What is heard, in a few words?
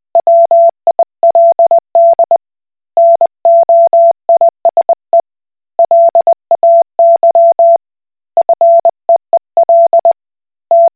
Koch Morse